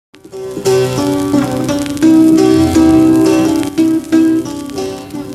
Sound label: music